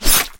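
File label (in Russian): разящий удар мачете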